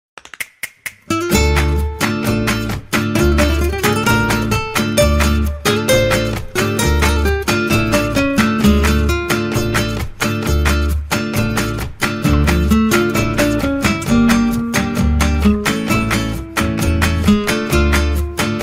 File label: Spanish Music Instrumental Spanish Music Flamenco